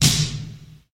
80s long acoustic snare a key, Royality free snare sample tuned to the A note; snare drum samples